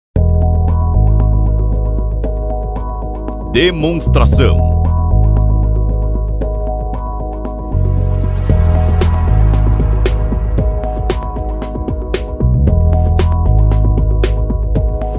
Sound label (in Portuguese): Trilha